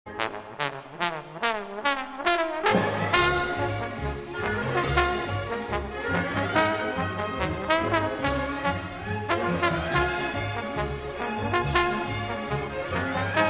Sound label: New stomp